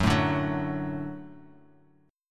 F sharp Minor Sharp 5th, Guitar Half Step Down

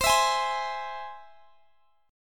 C Augmented down Guitar Drop D

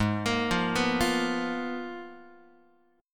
G sharp Minor 6th Add 9th, Guitar; arpeggiated; x,4,1,3,3,4; 4A,4E,5A,5D,5G#,6C#

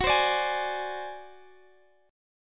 D 7th Flat 5th, chord

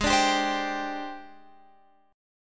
Ab Major 7th Flat 5th Ab, down, Guitar, Open F; AbM7b5 strummed

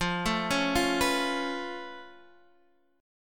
C sharp Augmented 7th, F

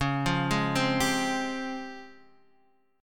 C sharp Major Sharp 11th, Guitar, Half Step Down; chord

